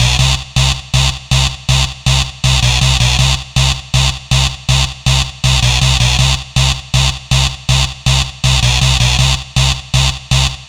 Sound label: Dance Synths, Hardcore Loops, Synth Loops